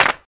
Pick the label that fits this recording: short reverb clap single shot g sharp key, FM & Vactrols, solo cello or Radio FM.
short reverb clap single shot g sharp key